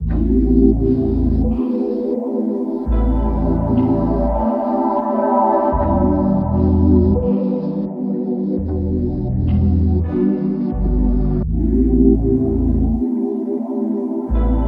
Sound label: 168 BPM E Min, Melodies; True Desire; Cymatics; Immortal Production Suite Beta